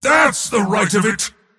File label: move pain; dota; mars